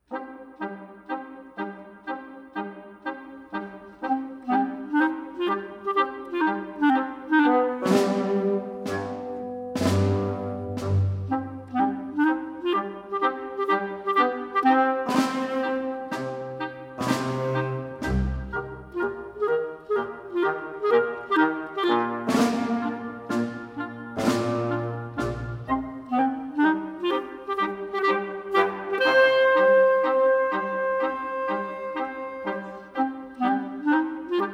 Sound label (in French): la chanson des bucherons, instrumental et melodie